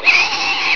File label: SQUEALER, ANIMALS